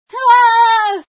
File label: scream, female sci